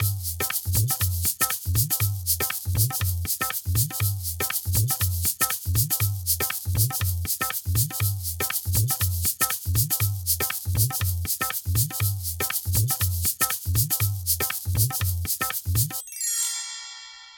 Musical accent tribal ethnic rhythm shaker tabla chimes; SFXBible